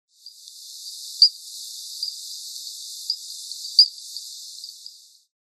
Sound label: Boophis mandraka